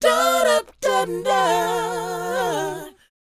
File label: DOWOP C; ILIO; Vocal Planet